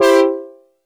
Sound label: HONK; Best Service Dream Experience; SF+EFFECTS